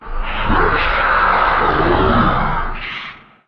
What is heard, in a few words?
dare win